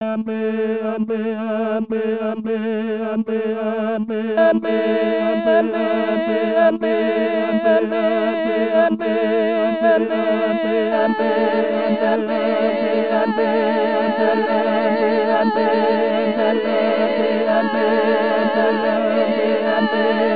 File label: Full choir